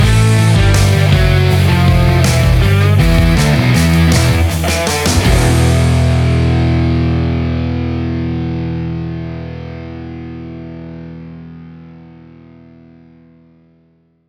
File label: Angry Classic Rock Music Stinger; AUDIOLANDER